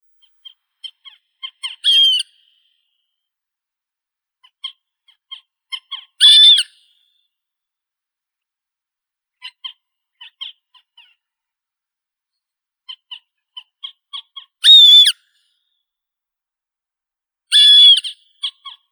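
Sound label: meadow harrier, Circus pygargus; lun lugovoi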